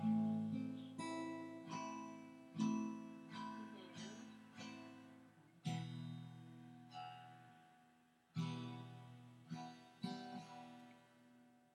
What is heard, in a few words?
Drew trying to remember how to play guitar.